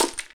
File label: Hit Ice